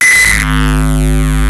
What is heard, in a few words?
Gabber Nasty screech; Gabber Master